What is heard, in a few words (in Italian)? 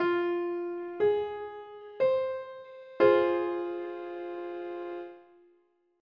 Triade minore